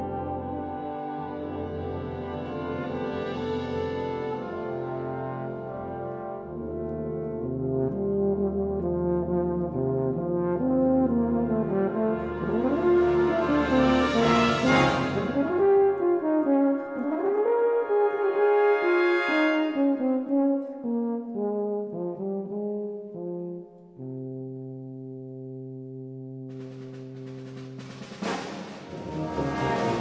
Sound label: Euphonium Fantasia